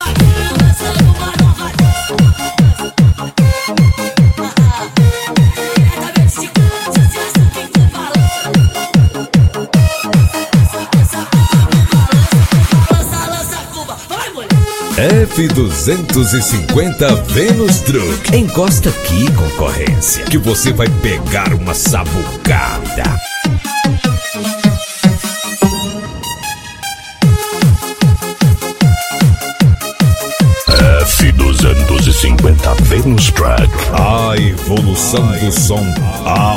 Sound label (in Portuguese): pancadao automotivo